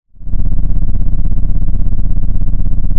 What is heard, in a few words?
Sound of an engine revving